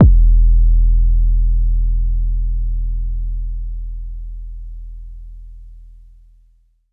phonk kicks oneshot - 808 E, Trap kick drum; trap kick drums

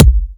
natural kick one shot g sharp key

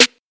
natural steel snare drum b key

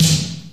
80s steel snare drum d sharp key; snare drum samples

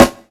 warm steel snare drum a key

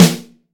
noisy steel snare drum a sharp key; snare drum samples

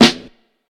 short reverb steel snare drum a sharp key

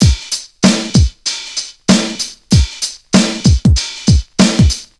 96 bpm drum loop c sharp key; sample

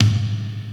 deep snare drum sound g sharp key

